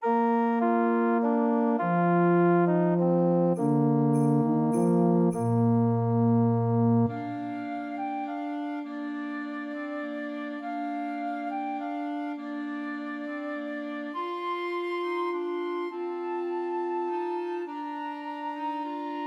Trios for Flute Clarinet and Alto Saxophone; Christmas; Silent Night

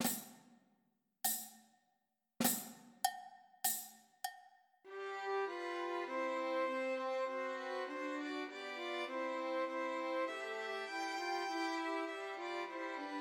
Trios for Three Violins; Once in Royal Davids City; Christmas

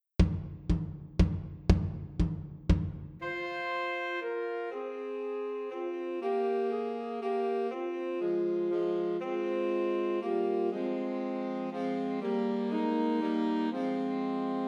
Two Altos and Tenor Saxophone; Christmas Trios

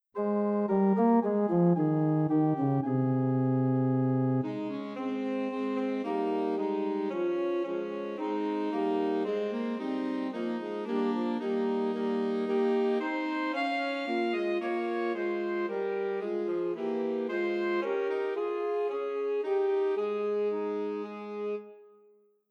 Two Altos and Tenor Saxophone; Christmas Trios

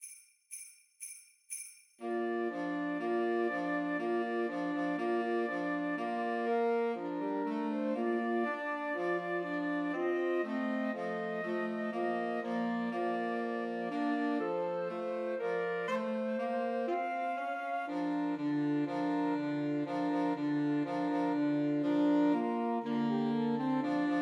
Trios for Flute Alto Sax and Tenor Sax; Christmas; Jingle Bells

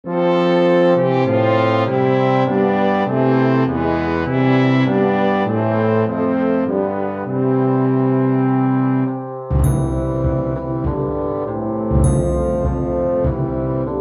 Bassoon Track with Melody; While Shepherds Watched

